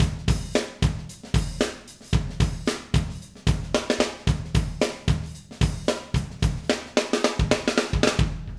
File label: Acoustic Live Drum; Platinum Audiolab